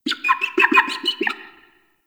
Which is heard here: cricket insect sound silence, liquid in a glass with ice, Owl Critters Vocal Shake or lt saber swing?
Owl Critters Vocal Shake